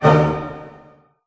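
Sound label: A major pianissimo, orchestra hits; Sound On Sound - Peter Siedleczek's Orchestra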